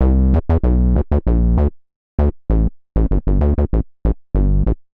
Slow Pull Bass A Minor 97 bpm; Bassline; MIDI Clips; Tonal; Ableton; Factory Packs; Chop and Swing

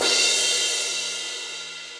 Crash 14 Room With A Vista, Cymbal; Drums; Drum Hits; Standard Hits; Session Drums Studio

